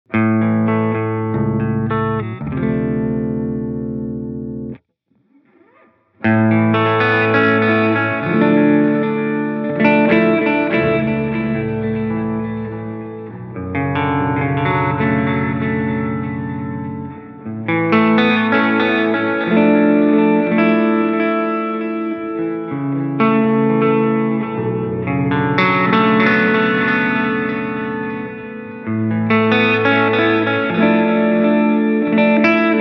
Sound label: Delay Reverb Hall